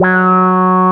QUAC, QUACK SOLO, Synth

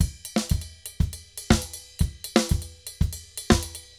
DRUMS, DRUMS SAMBA; Best Service ProSamples vol.14; AKAI

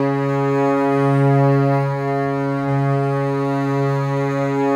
Contrabasses C#2; Best Service ProSamples vol.51 - Classic Orchestra 2

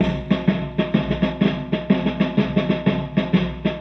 Loop, rhythmate, classic machines

